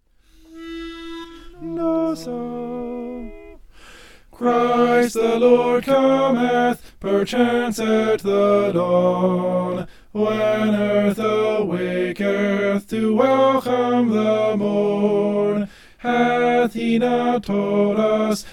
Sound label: soprano; christ the lord cometh